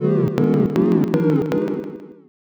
laugh crowd

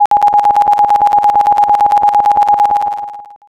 trainbell

